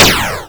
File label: haha; ゆめ2っき